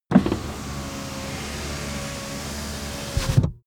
car window front close full

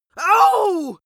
pain compassion male; vocals; comedy-cartoon; soundpool

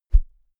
whoosh thick low hard

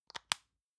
lotion lid close medium; friseur; berufe

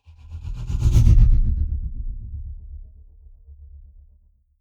sci-fi vehicle drive by tonal flutter; raumschiff

